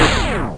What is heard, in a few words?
Shield